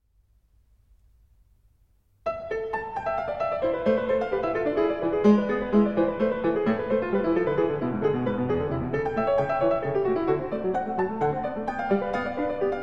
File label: English Suite in A Minor; J.S. Bach; BWV 807